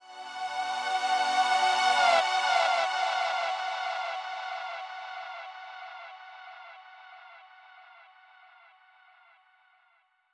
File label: Synth FX 138 BPM; Sound FX; Bminor